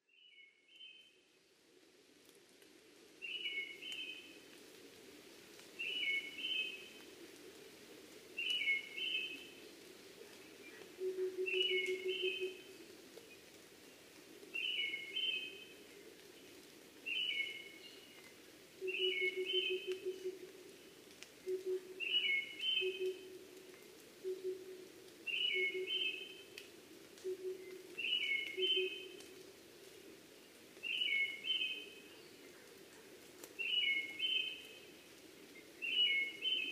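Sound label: akahara tutudori, brown-headed thrush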